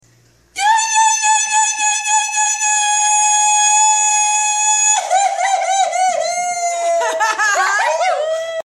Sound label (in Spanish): sapucay gaucho